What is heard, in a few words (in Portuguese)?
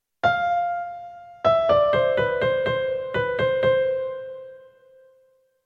polifonia